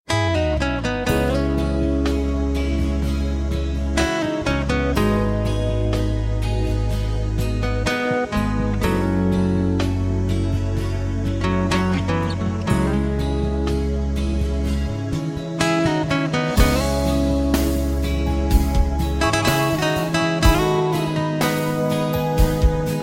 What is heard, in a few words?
soft guitar